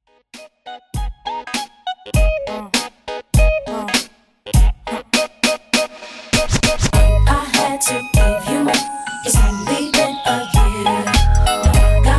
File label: Mix Show 100 bpm; Redrum